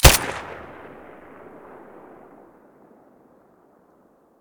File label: silencer glock17 stereo